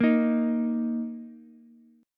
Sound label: Eb 5th, Ukulele; Eb5 strummed; x,x,6,6; JGuitar